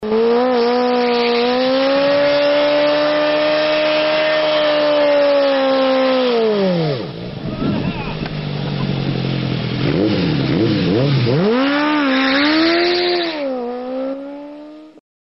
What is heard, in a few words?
burnout